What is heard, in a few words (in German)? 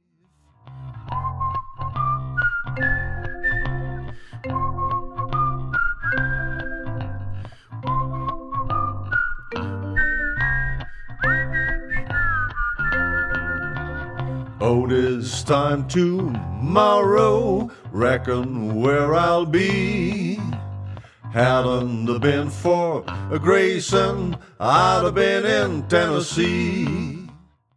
tom dooley ohne ukulele